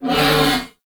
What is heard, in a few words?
Ram Pain; Animals; Duviri